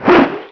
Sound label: knuckle swing; lancer weapon fx